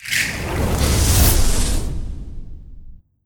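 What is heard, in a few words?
Orc Sorcerer Flame Attack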